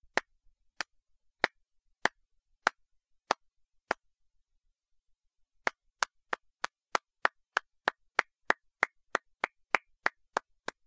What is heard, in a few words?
quiet clap